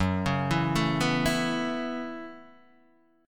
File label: Gb Minor 11th Gb Guitar Open D